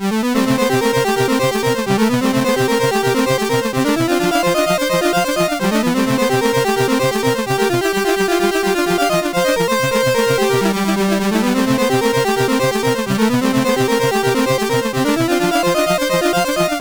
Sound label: Bulldog, CSG8580R5